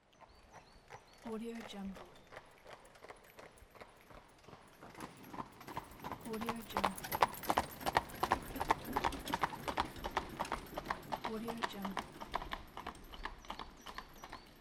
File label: horse carriage